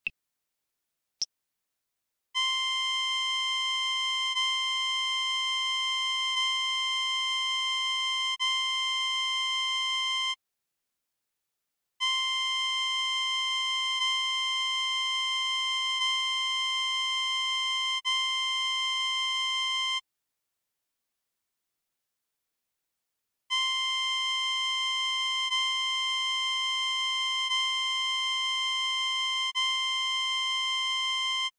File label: c harmonica